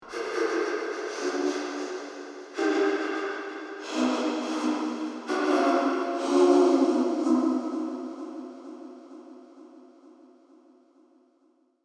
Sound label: Spooky ghost wail